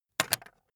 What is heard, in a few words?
pen put down